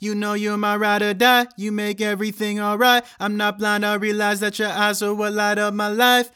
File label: dry vocal